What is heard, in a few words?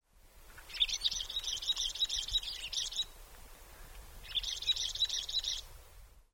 greater yellow finch